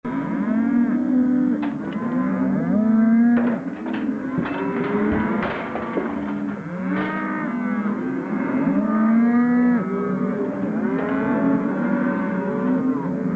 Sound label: cows mooing herd